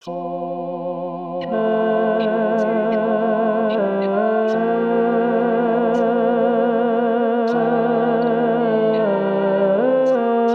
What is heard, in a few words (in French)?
cloches